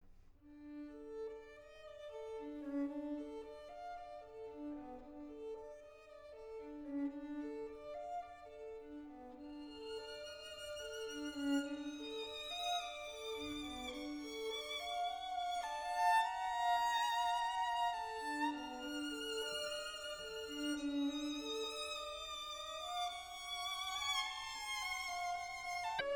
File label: String Quartet, Lento